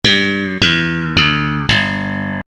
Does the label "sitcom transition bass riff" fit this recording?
Yes